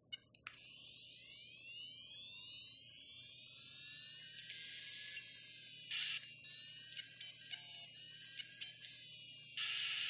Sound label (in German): SAS Festplatte klickt schleift